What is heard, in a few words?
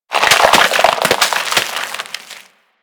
Rupture Manifest, Infestation; Warframe; Warframes